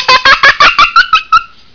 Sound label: giggle